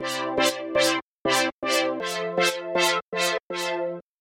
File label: synth simple side; synth1